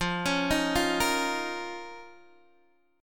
F 7th Suspended 4th Sharp 5th F down Guitar DADGAD